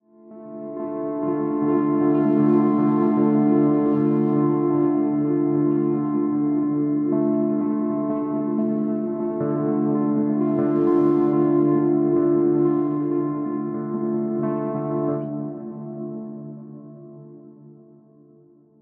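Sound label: pad D minor, synths pads; wremya; base pack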